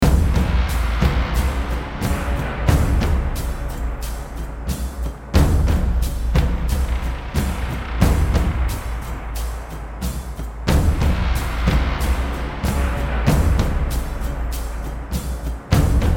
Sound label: coronation, Modern Percussion; Audio Ollie LA Modern Percussion 1.1 KONTAKT